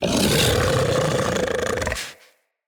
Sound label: Kubrow Feral Vocal Alerted, Enemies